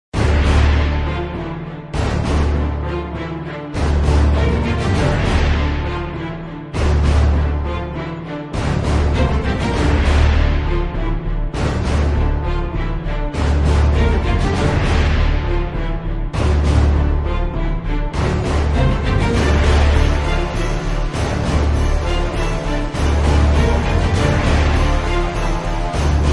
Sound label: No Vocals